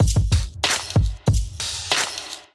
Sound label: Kit groove 94 bpm, Drums; Blueprint Kit groove